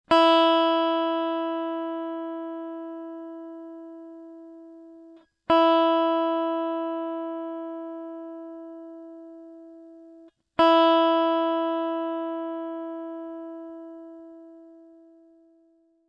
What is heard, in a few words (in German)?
Stimmton E